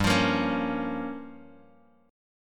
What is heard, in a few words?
G Minor 7th Flat 5th, Guitar DADGAD